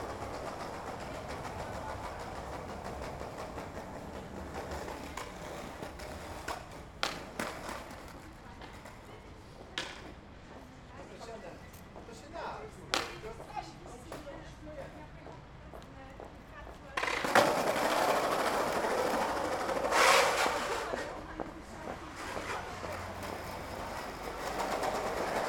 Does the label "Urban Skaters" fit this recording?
Yes